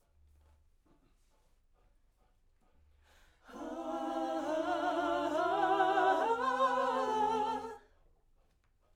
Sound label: Backs; SCHOEPS